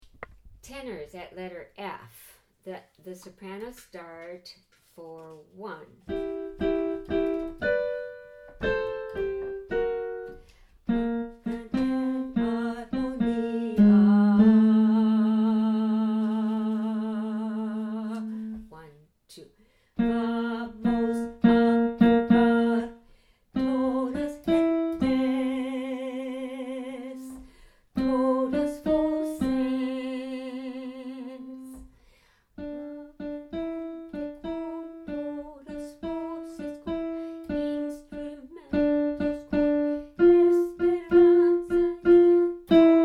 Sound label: ritmo tenor